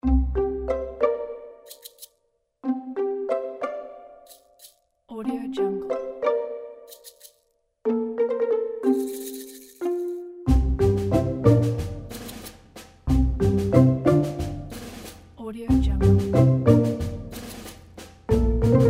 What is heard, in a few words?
sneaky pizzicato